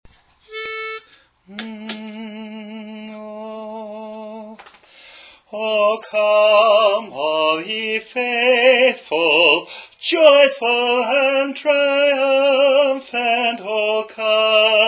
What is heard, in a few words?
Lead; O Come All Ye Faithful